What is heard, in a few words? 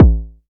kick, Novation Bass Station 2